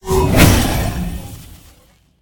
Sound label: activate; smite; god tiamat